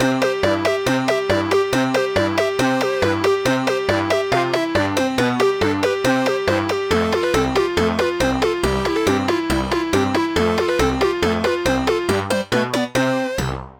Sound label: Xmas Factory